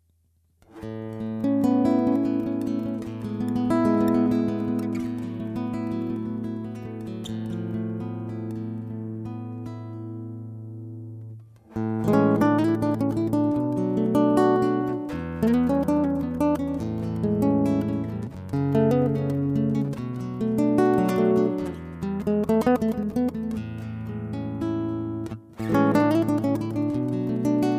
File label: g solo